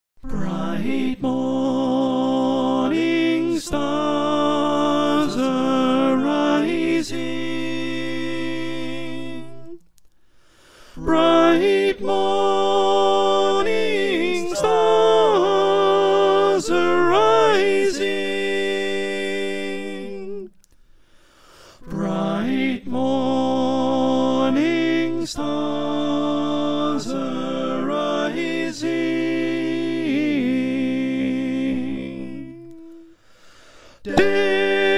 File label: Alto